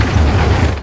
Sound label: Crane attack